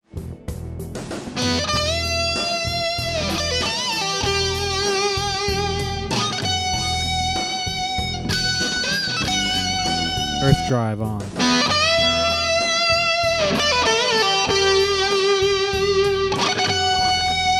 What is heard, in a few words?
Big Muff Blend; EARTH DRIVE